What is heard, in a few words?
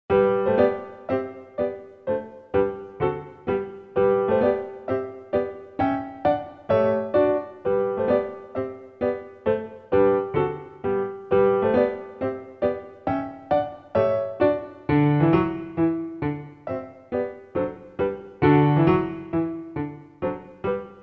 Marche Militaire; Schumann; N° 2 Op 68